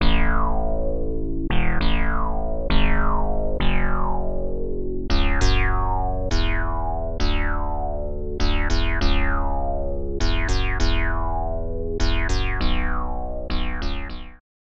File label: kawai k3 resonan; bassesland